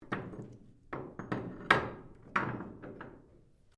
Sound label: Hatch Lock, Sounds onboard HMAS Castlemaine; Boat; Boats; Vehicles